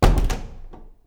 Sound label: Door Close